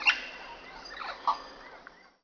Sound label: bird; Zeus